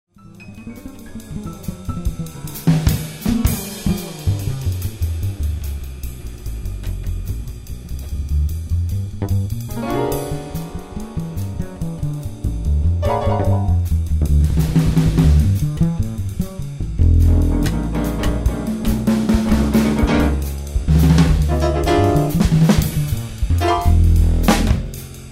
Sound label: Jazz Dance